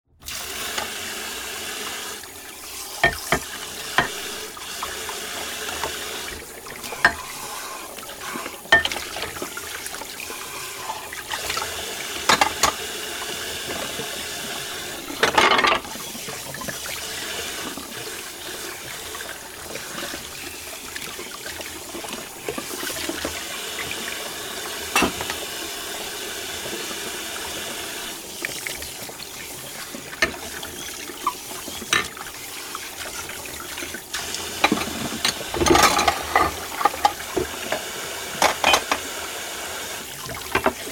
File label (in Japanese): 食器を洗う